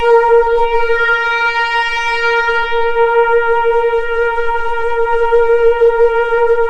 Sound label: A#, Synclav FM; Big Fish Audio; SPACE2